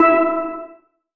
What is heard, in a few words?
steel drum (E); Sonic Foundry (Sony Creative Software); Ugly Remnants VOL-2